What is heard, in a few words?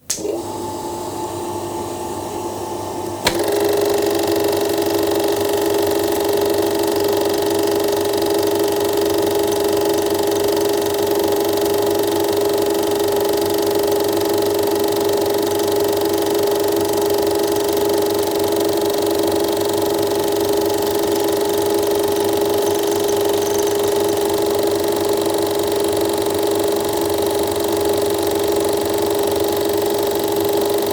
film projector 8mm running 24 fps